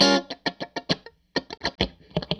Strat Guitar Loop

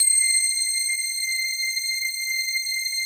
PAD, DIGI-PAD

